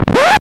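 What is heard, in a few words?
crackle of a vinyl record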